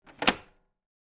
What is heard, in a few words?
Cassette recorder close